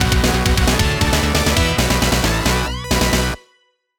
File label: Scene Clear; Teenage Mutant Ninja Turtles The Hyperstone Heist; Genesis